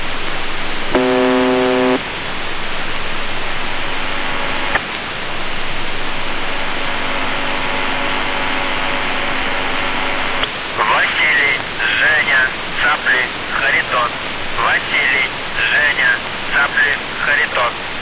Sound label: buzzer usb msg